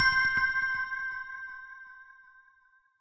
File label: coin Count End; hiddencoinsofegypt